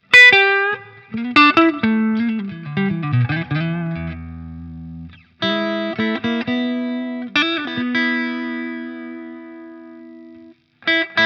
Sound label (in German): KALUNA Strat Hals Gain 11.00h; Vahlbruch